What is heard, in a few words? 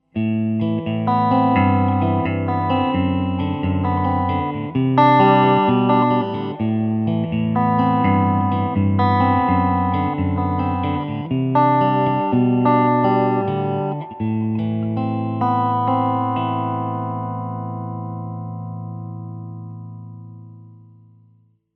clean, guitar; monza